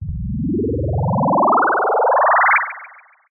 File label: synth rocket clock robot wobble power up short; Jessey Drake